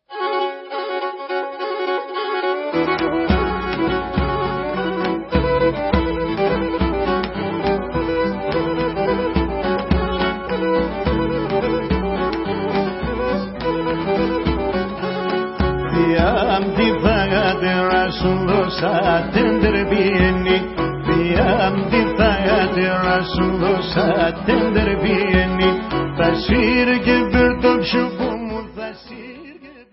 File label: Chala Chala Greek